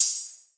Open Hihat; Coma; Cymatics; Trap Starter Pack

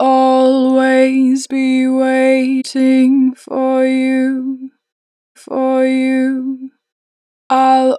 Natural Vibrato, Vocals, 4 bar vib; Be Waiting; Harmor Vocals

